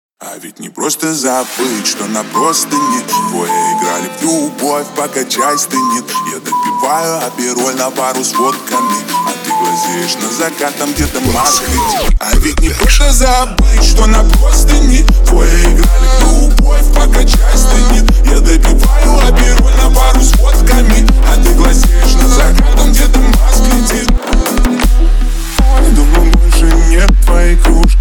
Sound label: soft nightcore, nightcore, bassboost